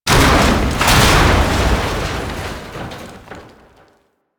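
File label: Tram Crash; Warframe; Ambience; Grineer Forest; Forest Vay Hek Boss Room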